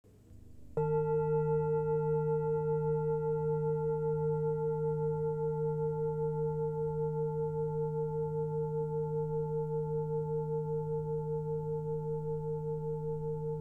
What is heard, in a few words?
Aum Mantra